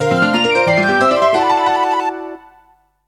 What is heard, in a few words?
alarm